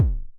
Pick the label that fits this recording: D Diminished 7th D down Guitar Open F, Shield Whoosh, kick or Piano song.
kick